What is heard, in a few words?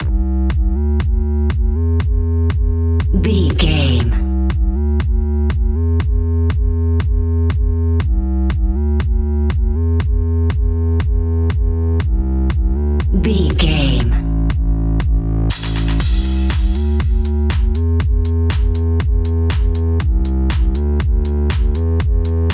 bass and drums